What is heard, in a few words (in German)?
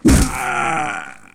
sterben; mount blade